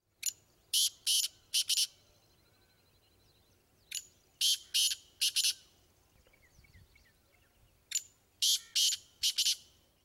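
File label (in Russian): black francolin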